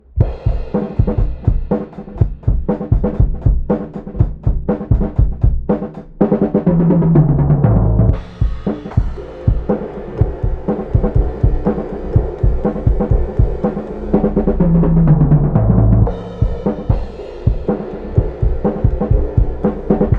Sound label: HIGHCUT 1000.00Hz; Analog Pro